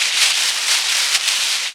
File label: sfx, FX Atmos; Best Service ProSamples vol.36; Chillout